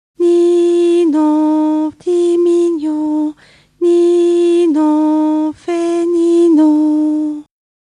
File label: 2 notes